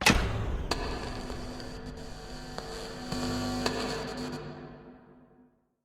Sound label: concert fail